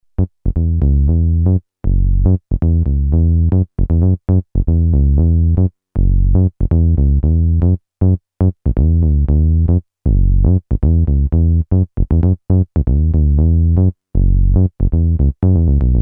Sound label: bass soft, novation bass station rack